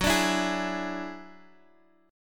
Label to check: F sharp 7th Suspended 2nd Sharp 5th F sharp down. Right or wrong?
Right